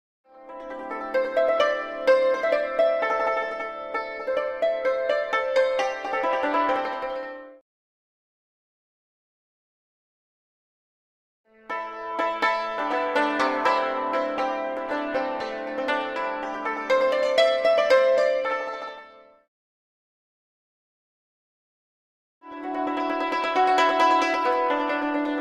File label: asia yangqin